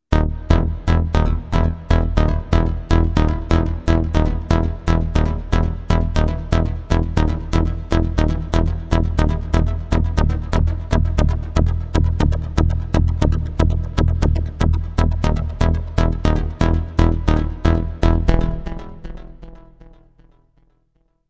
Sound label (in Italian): Basso